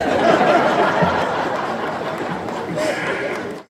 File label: Massive Laughter